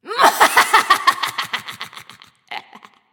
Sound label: laugh; Smite; caster